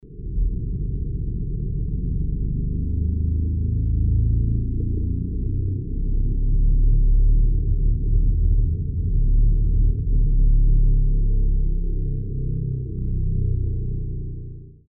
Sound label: Harmonic Tremor